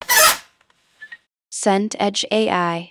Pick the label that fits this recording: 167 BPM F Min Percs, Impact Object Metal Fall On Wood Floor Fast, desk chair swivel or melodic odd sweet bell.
desk chair swivel